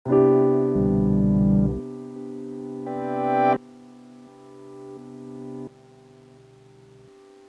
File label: piano; portal